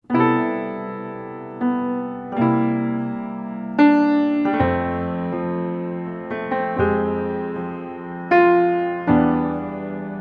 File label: Piano; pp pianissimo ayatsuri ningyou no rinbu original sound track tonality; Piano Arrange Ver.